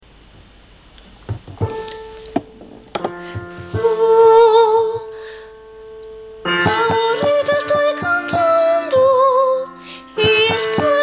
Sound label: ya se va tenor